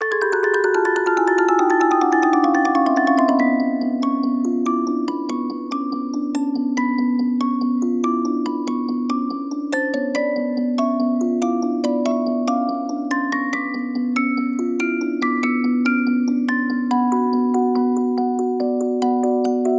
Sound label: Video Game Music Box